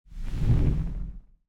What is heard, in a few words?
ripple